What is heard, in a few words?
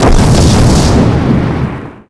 Large Exp, Explosions